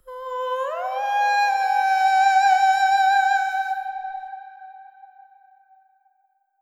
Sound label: sing; siren